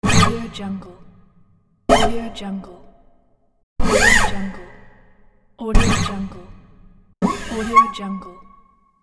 sliding guitar string

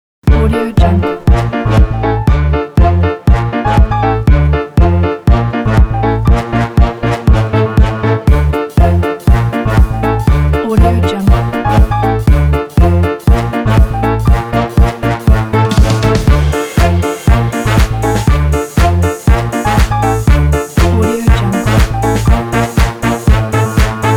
electro swing jazz

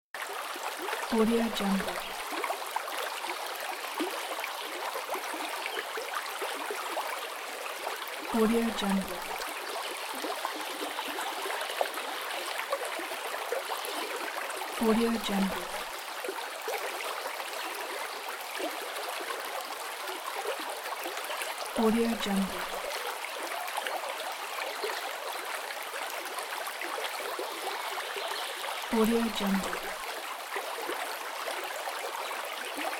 water stream

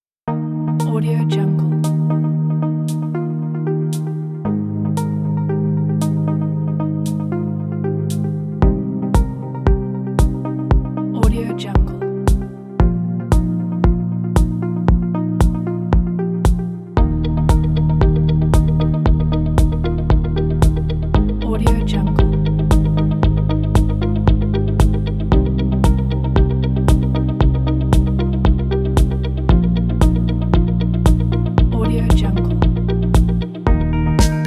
corporate inspiration

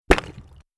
shale break low end crackling rock

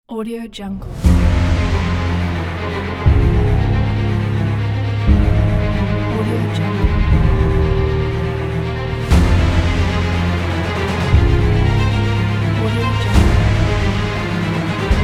hero movie ident

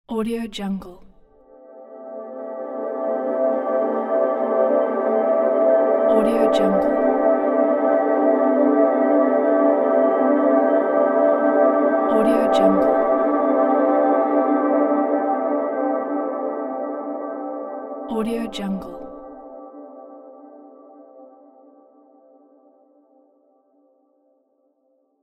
magic pad

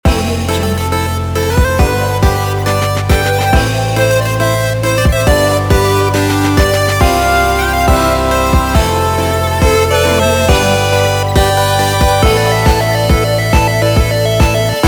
8 bit epic logo

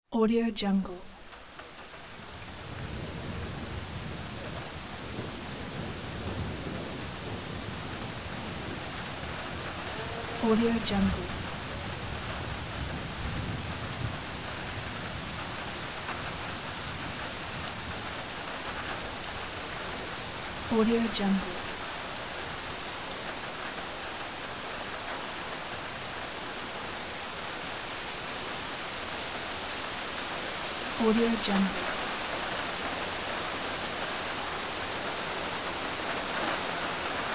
rain with thunder in the park

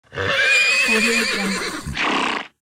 horse neighs; soundwood

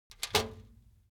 object office trash basket metal hit rattle high; smartsoundfx

